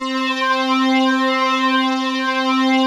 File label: FLANGE C5; Greytsounds - Scott Peers Sound Engineering